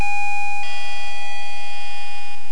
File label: chime